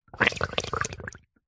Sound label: foley balloon pump in shallow water release air bubbles; zapsplat